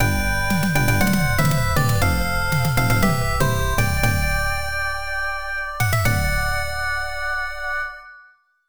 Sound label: Stage Clear; Sonic Linker; OPNA; PC-98